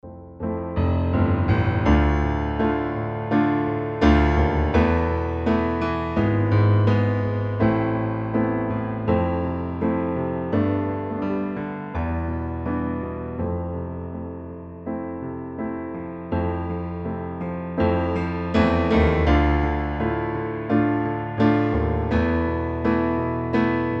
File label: Piano Original